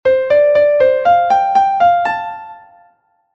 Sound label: candy pickup